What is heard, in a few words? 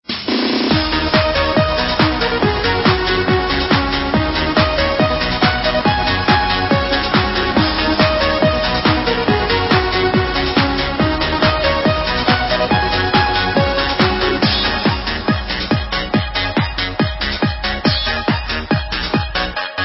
Chinese dance